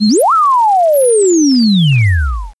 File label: Very Long Electronic Button Sound